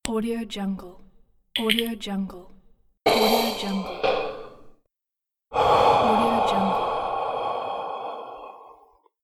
vaping sounds

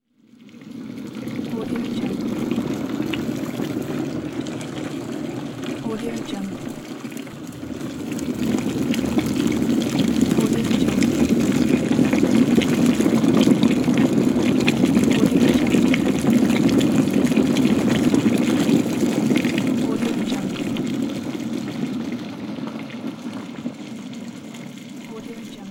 boiling water

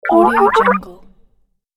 arcade game short rising bleeps

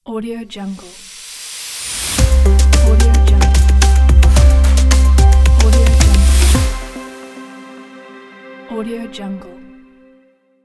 intro tech logo

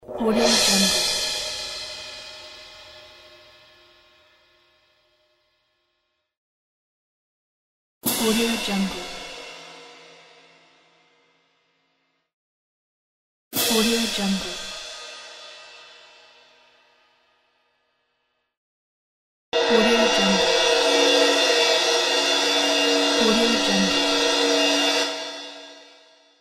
orchestral crash

